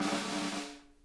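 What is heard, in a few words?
snare roll, drum kits; Sonic Reality; Interactive Drum Kits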